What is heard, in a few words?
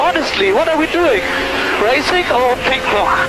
ping pong; vettel